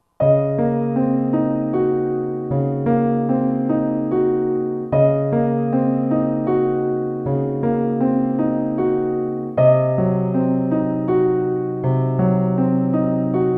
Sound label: Grand Piano; Roland SR-JV80-03 Piano